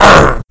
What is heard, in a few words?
pain; sleeper